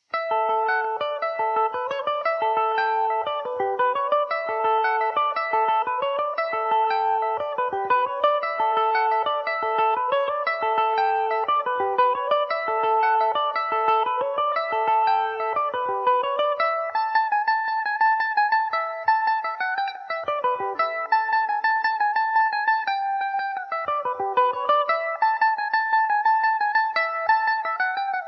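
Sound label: A mixolydian, Irish mandolin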